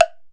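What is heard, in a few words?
temple block; E-MU Formula 4000 Series Vol. 5; Protozoa; Proteus2 Instrmt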